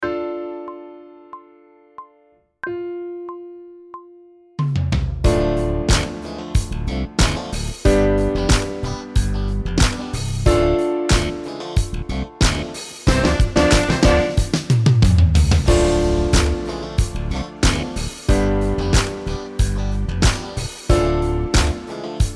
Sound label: Sight Singing backing track, pop vocals; Grade 5